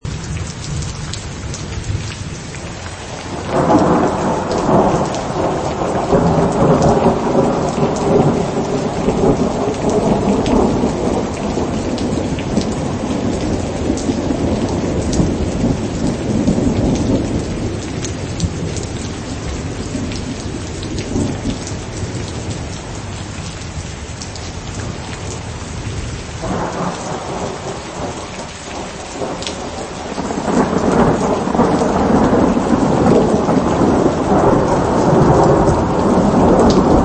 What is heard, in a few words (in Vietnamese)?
Tieng muu roi sam set